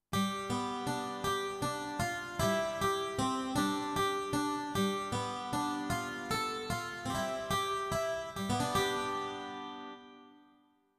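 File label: 12 Str Guitar, digital piano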